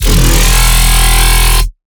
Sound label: Monster, Dubstep Bass Hits; Ghosthack; Advent Calendar 2017